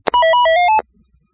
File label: radio on; svencoop